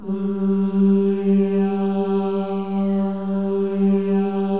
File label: MOVING V1, Symphony of Voices; Spectrasonics